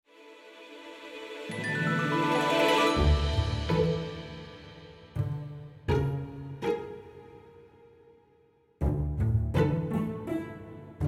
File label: scene bpm 82 loop